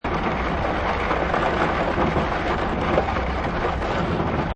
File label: Object Slide On Ground Loop, Dirty grinding sound of object drag; Dirty grinding sound of object drag on ground. Heavy gate, plates or wood scrape ground. Seaml